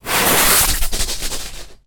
leisure inflatable rubber ring throw slide on hard ground